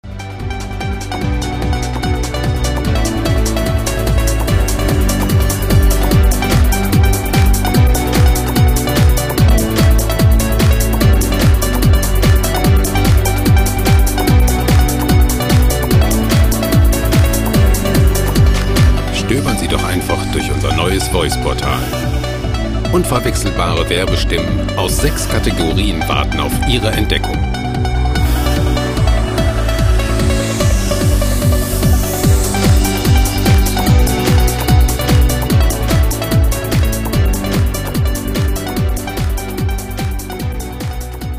summer trance

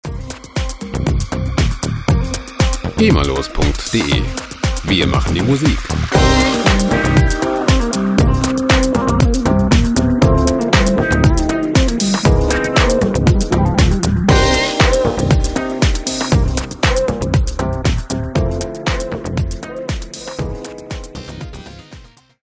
absolute funk

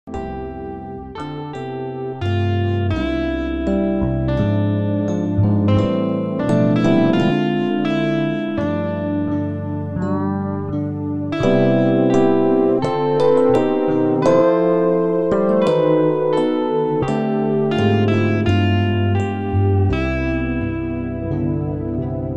jazz

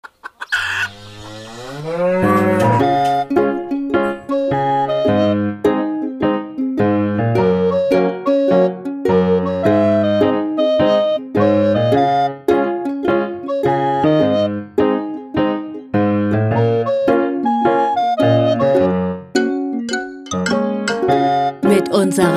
happy cow waltz

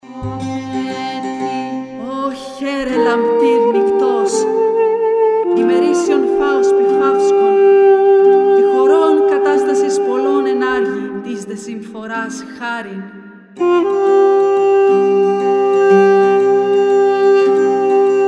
ancient greek music

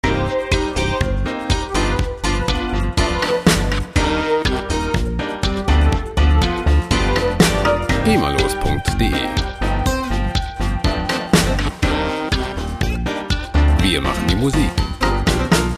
beguine

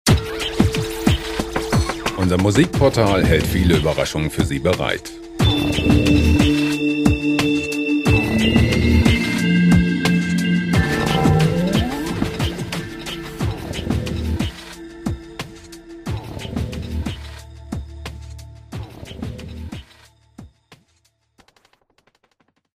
jungle hard

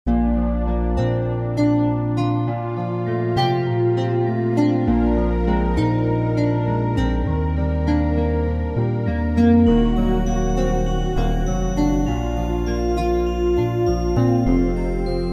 simple saw

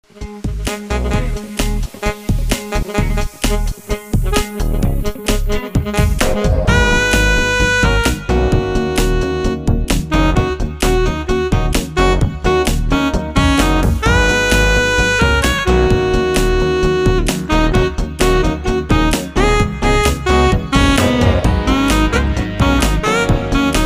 jazzy sax rock

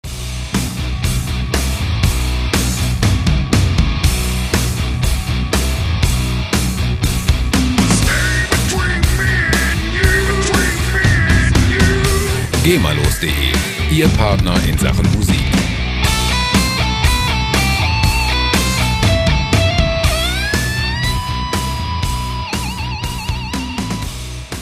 metal dragon

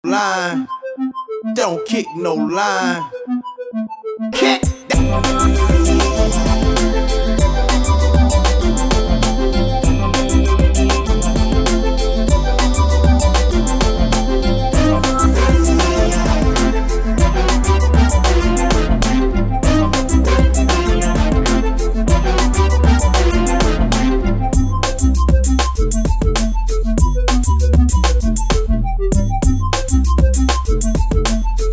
bongo flava

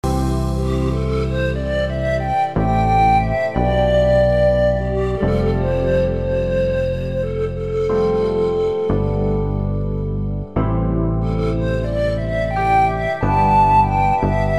huaino ballad

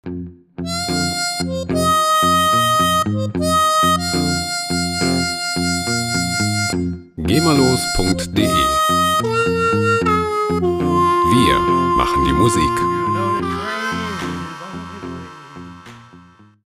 easy train blues

